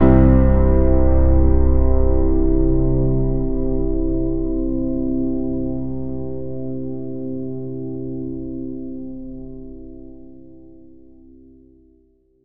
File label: synthesized single piano